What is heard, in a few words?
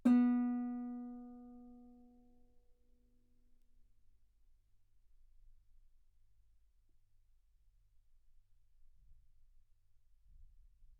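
Guitar ff B string B3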